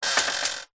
household bed bunk metal framed movement creak loose single; zapsplat